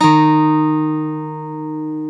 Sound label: GTR, Dual Guitar, Ac 12+; Club-50 - Foundations